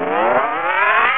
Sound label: SHRINK